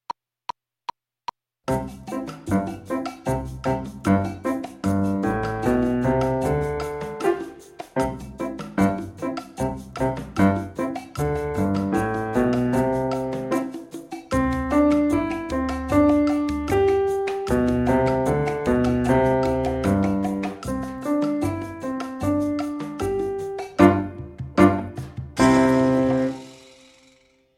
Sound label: Piano